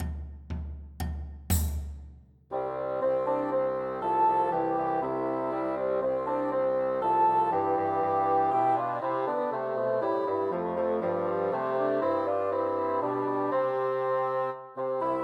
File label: The Holly and the Ivy Christmas Trios for Three Bassoons